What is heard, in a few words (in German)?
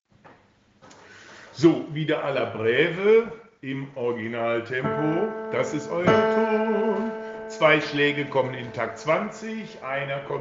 BN Tenor atempo